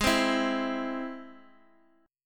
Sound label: Ab Augmented, Guitar, DADGAD; x,x,x,1,3,2